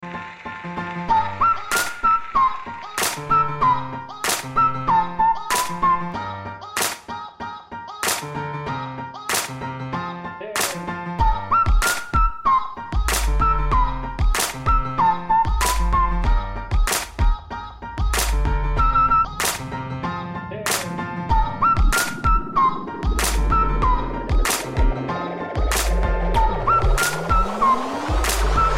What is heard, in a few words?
Trap Whistle Piano